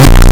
distortion

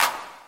clap

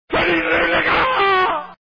warcry; raiden

